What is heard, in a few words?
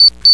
BEEP